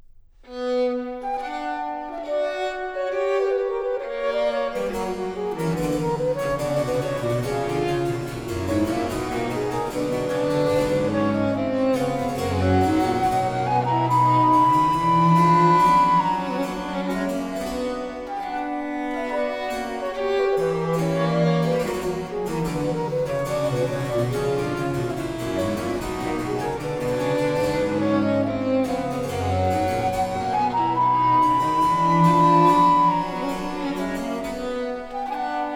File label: Canon perpetuus super thema Regium (flute, violin, cello, harpsichord); Bach; Musikalisches Opfer BWV 1079